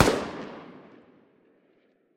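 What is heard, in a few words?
noise of a bullet flying away after a pistol shot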